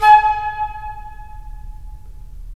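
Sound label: FL, flute; LA Composer vol. 3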